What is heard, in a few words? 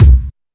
verby kick drum c key